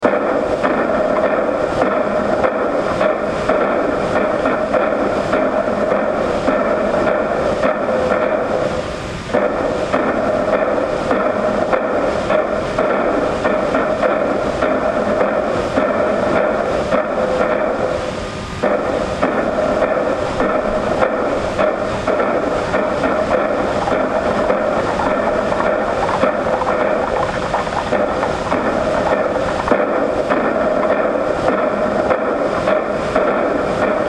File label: drums; octopussy